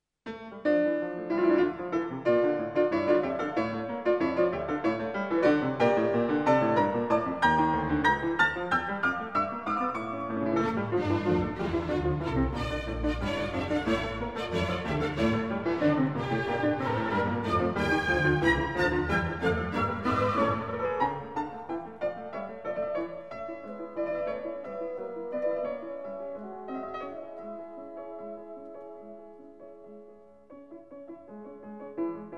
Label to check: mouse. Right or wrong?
Wrong